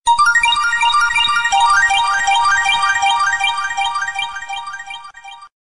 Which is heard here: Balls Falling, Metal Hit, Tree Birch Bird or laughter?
Balls Falling